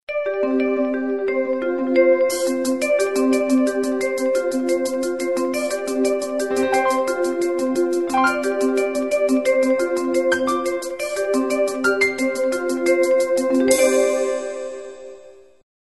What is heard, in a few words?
graphic presentation